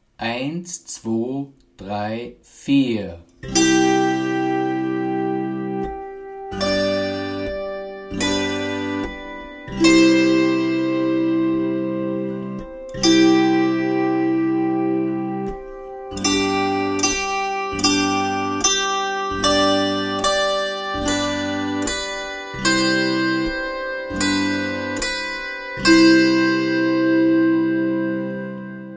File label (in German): Wechsel zwischen C-Dur und G, Akkordzither